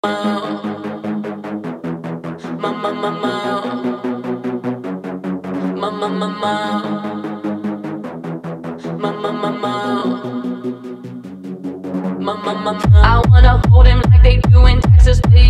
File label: Techno Remix